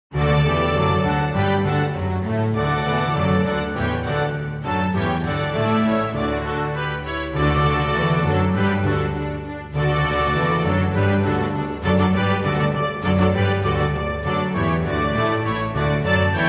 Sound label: Orchestra; Hallelujah Chorus